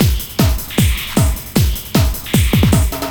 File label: LOOP, Eurotech; Sounds Good